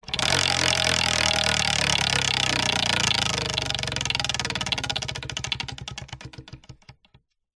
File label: leisure wheel of fortune wooden spin medium